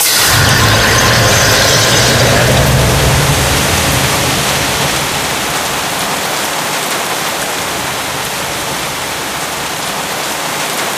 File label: sprinkler system; wheatley; Source Engine; Portal 2